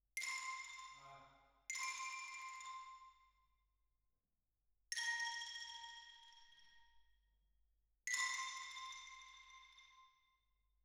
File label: Twin R Xylo